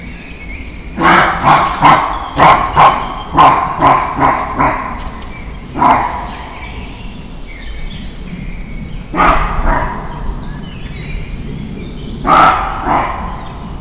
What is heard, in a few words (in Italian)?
Verso del CAPRIOLO; punto 1 altana